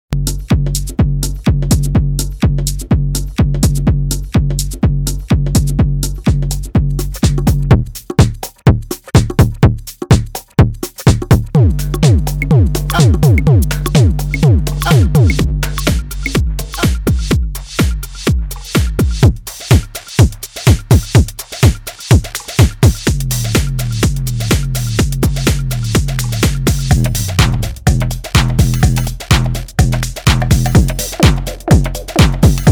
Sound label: monster kicks